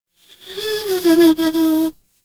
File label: animal insect